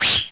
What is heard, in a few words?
Swish; PC Gamer (Italian) 36